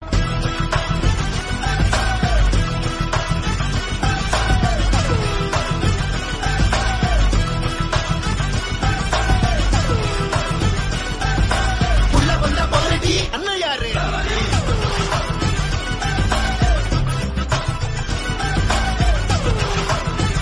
Climax Tamil Ringtone

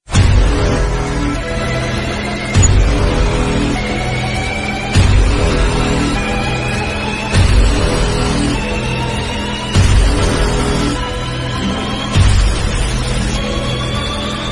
Villain Bgm Ringtone; ringtones

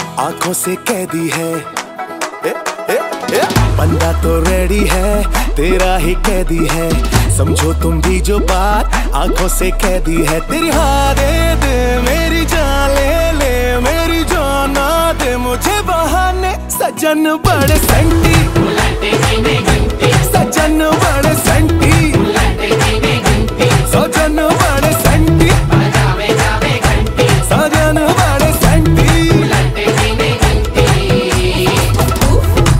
Latest Bollywood Movie Song Ringtone; Badhaai Ho

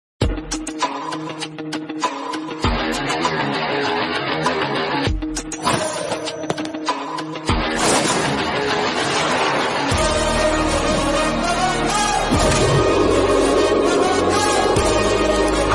Bgm Ringtone; Vakeel Saab

